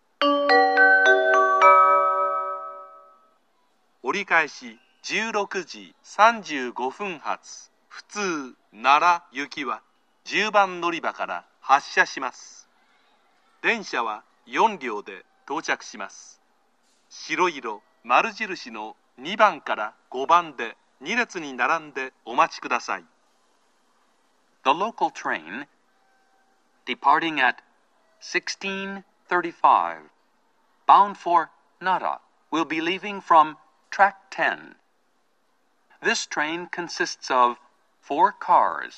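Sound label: Kyoto next departure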